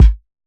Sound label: Kick; Ableton Live 12 Lite; Core Library; Drums